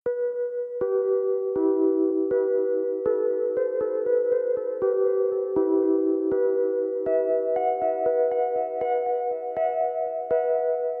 guitar chill relax 432 hz; relax with coffee

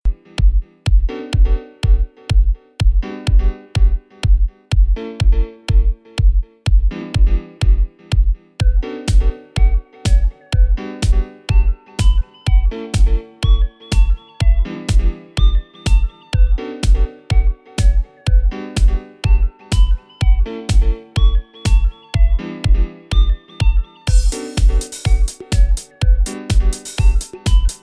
aerobic dance 432 hz; 124 BPM

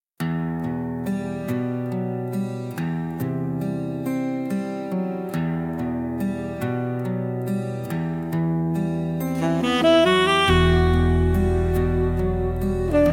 golden sax vibes

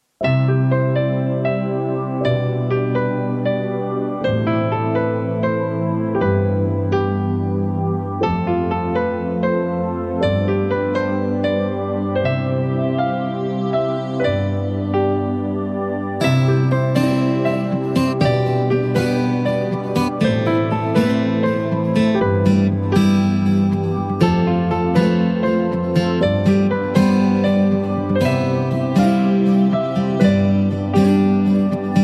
ambient with nature; talk to me

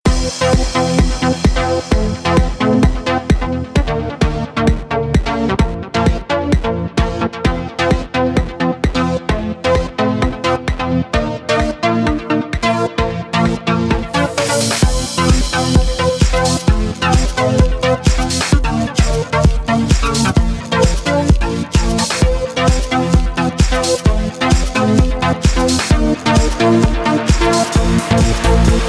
dance mix, 432 Hz

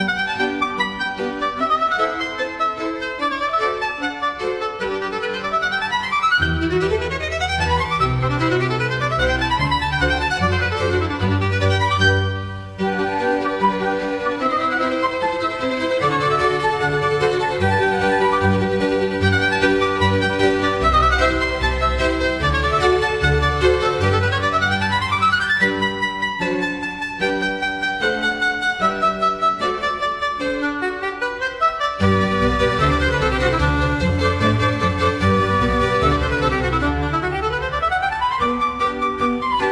Baroque Splendor

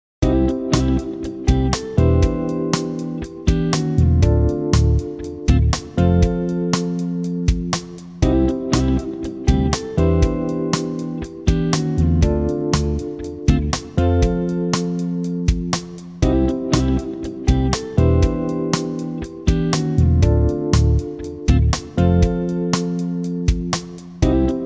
Chill Guitar

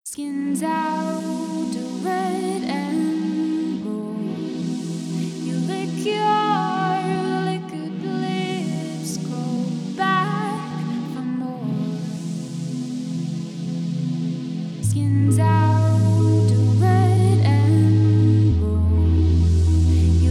vocall chill 432 hz; liquor of lips

